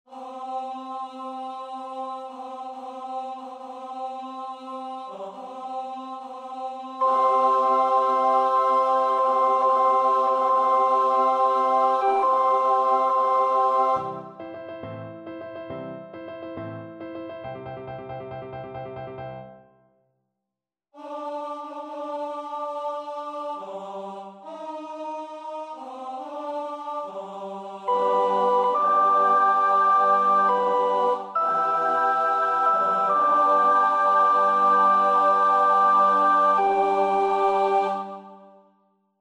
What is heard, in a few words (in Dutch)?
alt 1; Bruch (Max); Moses op.67